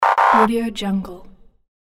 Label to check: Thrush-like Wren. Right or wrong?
Wrong